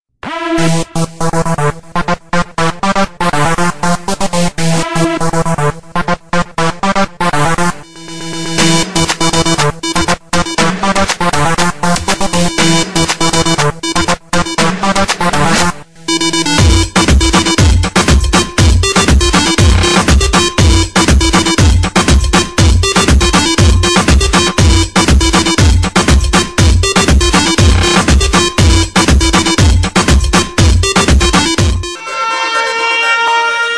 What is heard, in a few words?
disco techno mix car; forumispark